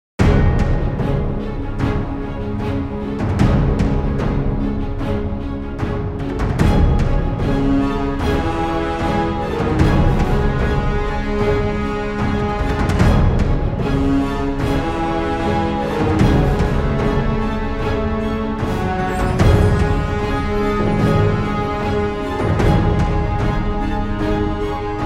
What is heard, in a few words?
Deep Perc Beds Epic and emotional percussion beds and action loops; Cinesamples